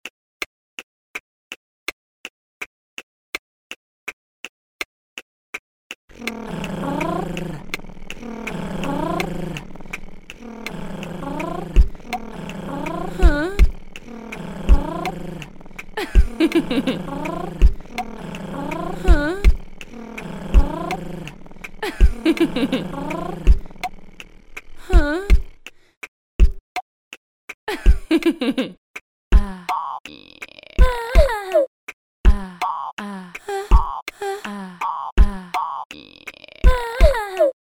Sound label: vocal FX